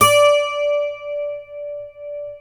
12 string d4, guitar

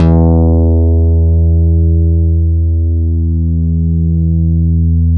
Synth Bass, Analog Bass

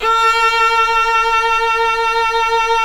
Synclavier Strings; ILIO

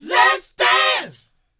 DANCE 120, Vocal; ILIO; Vocal Planet VOL-4; R&B & Dance

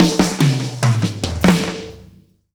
Fills 144; Zero-G; Monster Beats

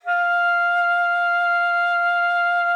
F5, alto sax; Xsample VOL-2

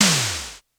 Electro Snare; Polyend; Legowelt

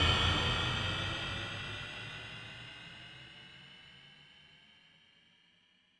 Take Off; Yamaha DTXpress-II

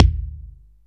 Kick, Akai MPC-500; Toolkits; Kick TKit

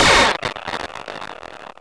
FM FX, Synth FX; AKAI S6000 CD-ROM - Volume 6

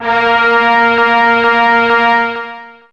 Horn ensm C4, Fairlight CMI IIe; Brass1

